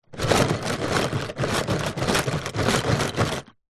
office felt tip pens pencils other stationery in plastic tub shake